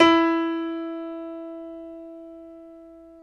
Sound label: PNO, Grand Pno; Club-50 - Foundations Roland